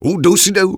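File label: Engineer taunt exert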